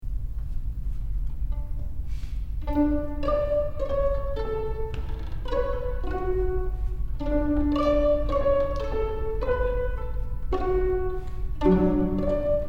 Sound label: Kalimba Lullaby